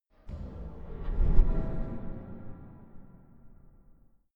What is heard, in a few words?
strong metallic impacts, metall